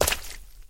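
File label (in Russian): close clear single plop of water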